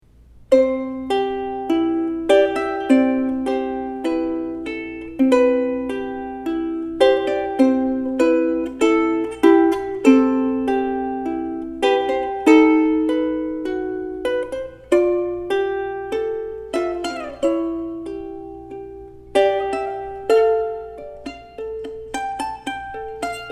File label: Ukulele, ukulele solo